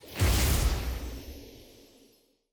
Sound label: loot upgrade bar max